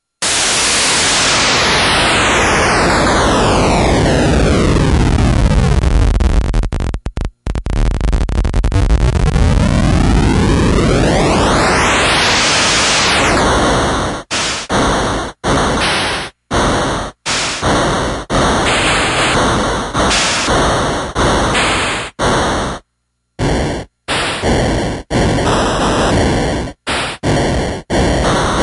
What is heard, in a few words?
noise sample; Thomas Henry; SN-Voice